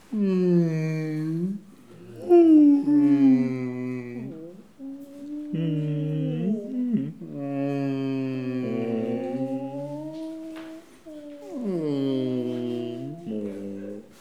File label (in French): bruit animal